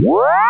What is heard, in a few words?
recharge; openrsc